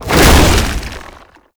tank infested melee swing; Enemies; Abilities; Lasrian Tank; Warframe

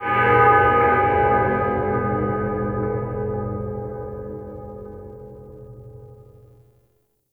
Distant Town Bell; Warframe; Duviri

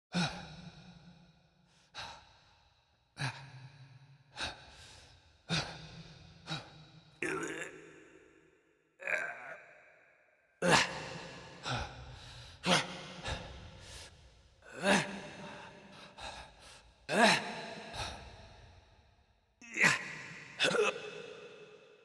Arena Wake Up Vocalizations Male; Envoy; Cinematics; Bromius; Bromius Quest Arena Wake Up

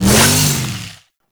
Saw Melee Swing; Warframe; Grn Ghoul Saw Player

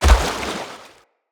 Gallop Water, Animals, Foley, Steps; Bromius

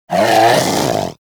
Predator Vocal Alerted; Warframe; Hunting Critters; Characters

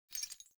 Grunt Foley Movement Chains; Sinecure; Melee Grunt

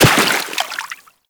Operator Run Water, Footsteps; Warframe

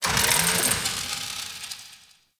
Distant Machines; Ambience; Warframe; Corpus Railjack

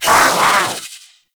Kavat Vocal Attack Medium; Warframe; Warframes; Khora

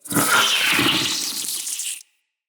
root spider vocal attack; Animals; Spiders; Vocals

